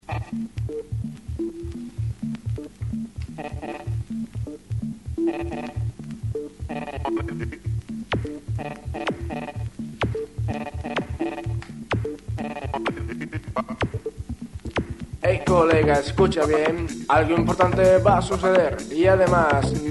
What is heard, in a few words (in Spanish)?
rap; telepuebla